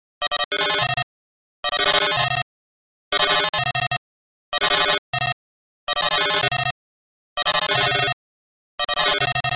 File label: bugs; RTcmix FMINST. instrument